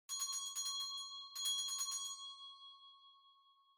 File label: Winning Bell Far Gentle